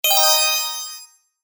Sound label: break and chain